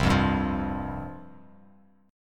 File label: C Suspended 4th C, Bass Standard; Csus4 strummed; 8,8,5,10; JGuitar